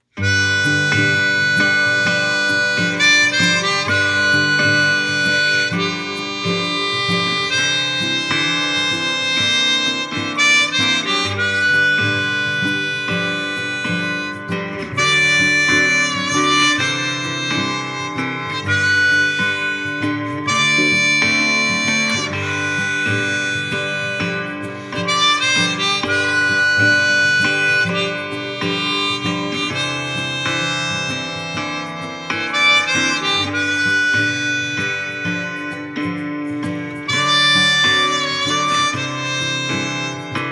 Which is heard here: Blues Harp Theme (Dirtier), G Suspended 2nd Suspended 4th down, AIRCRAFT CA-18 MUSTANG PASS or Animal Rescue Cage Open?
Blues Harp Theme (Dirtier)